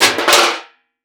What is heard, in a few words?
Crash Aluminum Tray Bang; Crashes